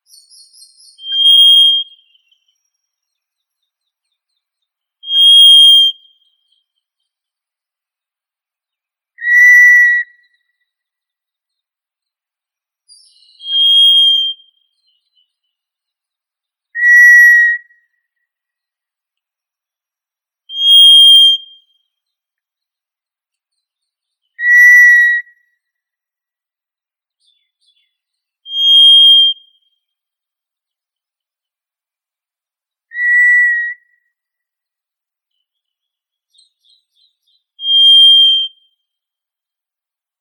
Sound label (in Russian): drozd pestrii zoothera dauma